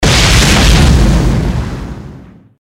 impact explosion

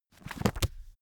heavy book shut

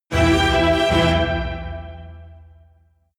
jingle orchestra fast

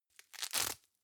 velcro open slow

